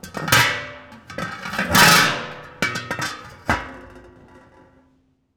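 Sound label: trash can, smashing; AKAI; 1000 Sound Effects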